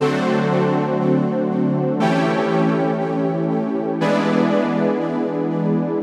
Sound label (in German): c dur nebenakkorde